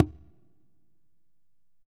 CONGAS WET; Q Up Arts; Denny Jaeger Private Collection

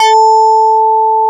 MARIMBA

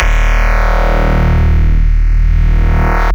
E Bass Shot; neuro; BIG5AUDIO

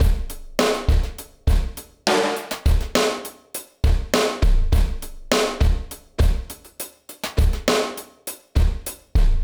Drum Break; Trip-Hop; Loops; Sonic Foundry (Sony Creative Software); Rondo Brothers Trip-Hop Loops & Samples; Liberation

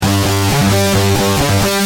G Pump, Guitar; Industrial

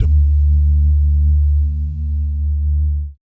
BASS DUMMS, Vocal Planet Jazz FX; Spectrasonics

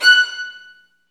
STR VNB2, Vlns Marc; Roland L-CD702; Vlns Marc1-3

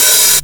HH OPEN

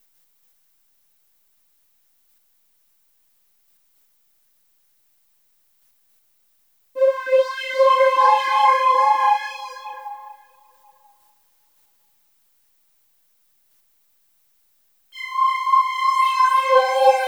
Warble Daze, electro lounge; Sonic Foundry (Sony Creative Software); 111 Hotty

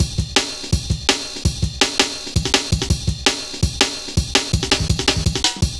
Rollin' Ride, Jungle Warfare, Drum Loops, 165.5; Zero-G Jungle Warfare 1, 2 & 3

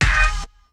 G-FUNK KIT, DRUMS; Kid Nepro; Elite

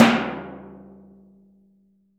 CAN HIT; AKAI S6000 CD-ROM - Volume 6

